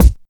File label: sharp kick drum sound b key